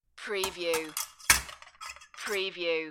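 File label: Metal Bar Tool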